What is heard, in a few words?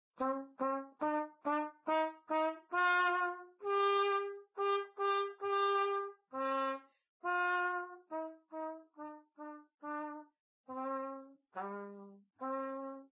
Trumpet Play The Dynamics; Instruments